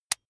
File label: electric button drill direction switch lock heavy